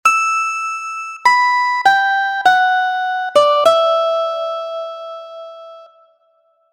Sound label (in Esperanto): Preludo 4-a, harmonikoj; Post rapida arpeĝo je la dua sekcio, Villa-Lobos re-prezentas la unuan temon, sed nun per harmonikoj